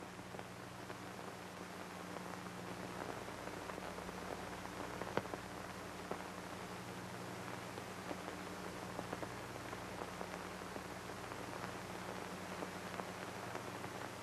Noise cut

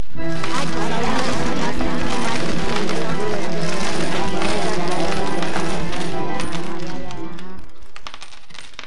Crowd Noise

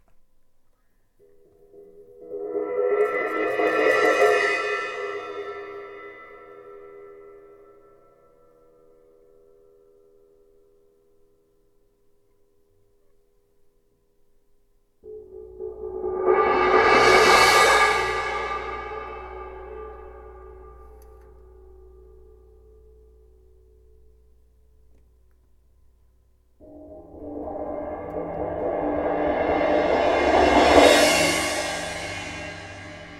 Cymbals Drum

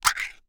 Bean Can Opening

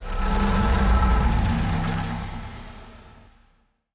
Big Scary Portal Open

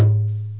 Djembe Low

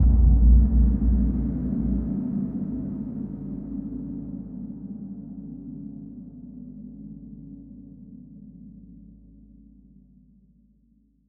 Dark Hit

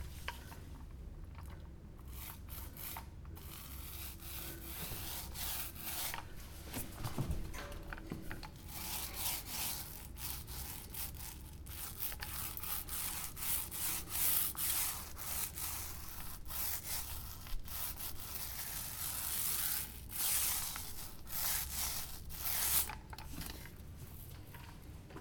Painting

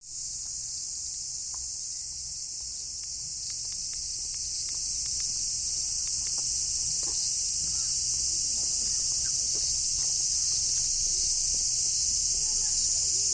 Higashiosaka Japan Forest

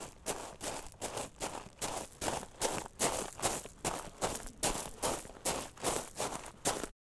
Footsteps Running On Gravel

